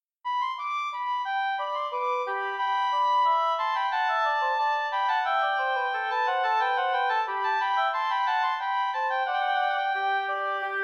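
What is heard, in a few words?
rondeau in g major 2 oboes; romain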